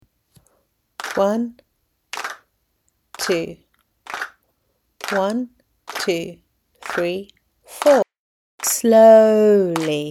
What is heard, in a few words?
Snail and mouse with pulse; key stage 1; year 1